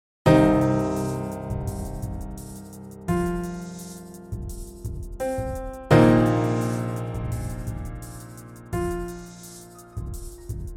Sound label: Swirls Acoustic